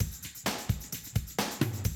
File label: 130, Classic DrumLoops